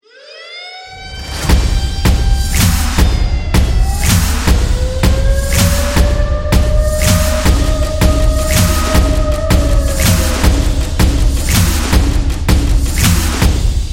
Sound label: Alarm